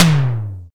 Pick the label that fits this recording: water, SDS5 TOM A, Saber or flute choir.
SDS5 TOM A